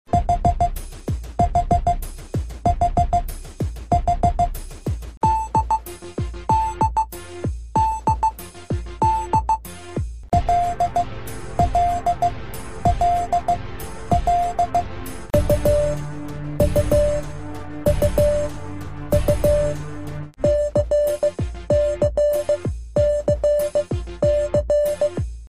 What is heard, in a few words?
Morse Song Sequence 2-H-D-L-U-C